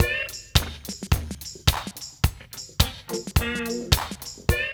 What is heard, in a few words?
LOOP, Funky Ass Loops; East West